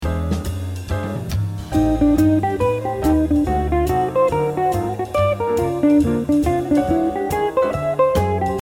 Lick

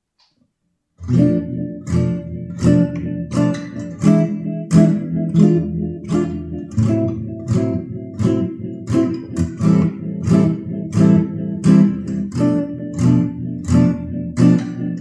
Impro II V I Am, guitar

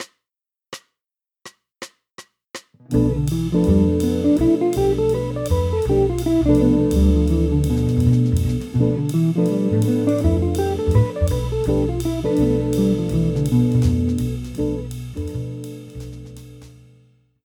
Bm7b5 in posizione di E

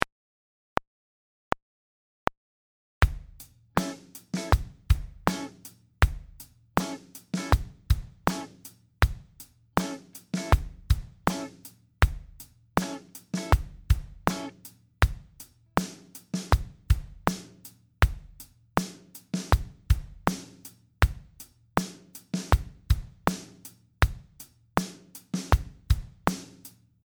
groove r&b

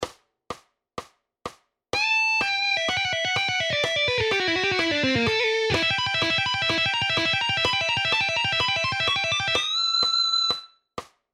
Rock Gtr Solo

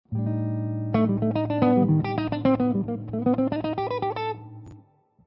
Locrian nat. 2 Jazz Lines, guitar

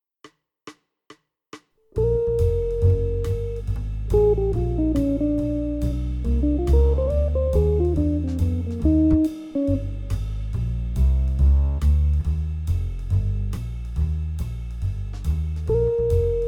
I7 (Medium)

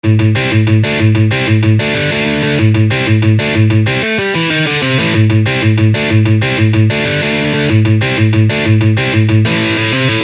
Lick

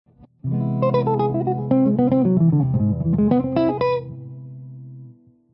Lydian Jazz Lines; Lydian 5 Jazz Lines

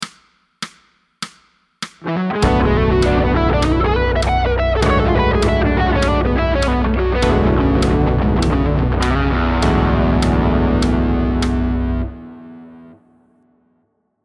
Lick

